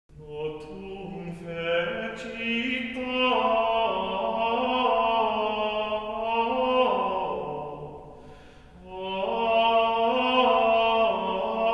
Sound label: Gregorian chant Mass, Gradual; Mass for Christmas Day